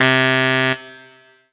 Korg TR Rack Baritone Sax C3

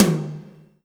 Yamaha TG100 Taiko Drm

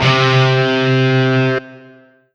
Roland SC-88 Distorted Guitar C3

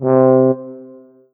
Roland SC-88 Tuba C3

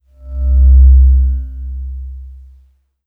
Yamaha TG100 Bowed Pd C2

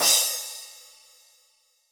Crash Cymbal

